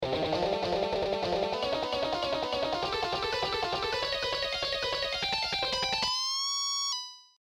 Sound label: Tapping; Tapping Licks; Adagio